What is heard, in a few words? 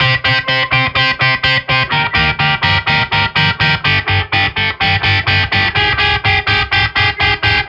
Angus, break beat